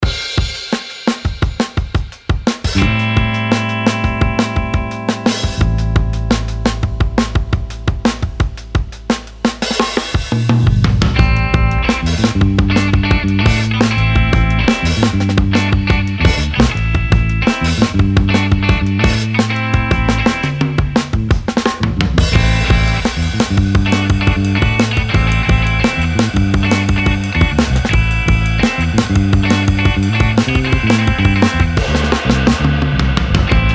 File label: Mockingbird Jazz